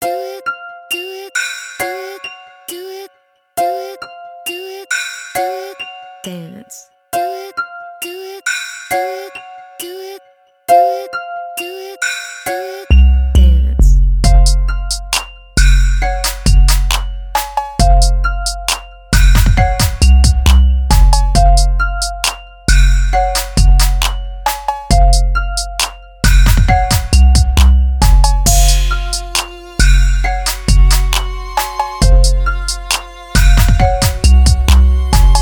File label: trap